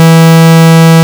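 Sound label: Ob Saw Square, Synths; Multisamples; Ableton Live 12 Lite; Core Library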